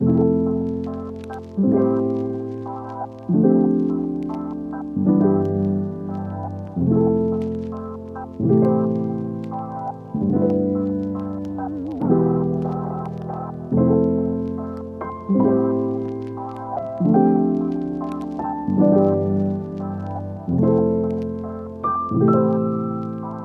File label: Melody 70 Am